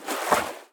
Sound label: Footsteps Water Wet Shallow